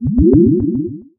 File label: Pickup Scifi Health; SFX Kit